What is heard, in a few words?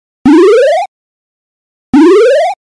healing in game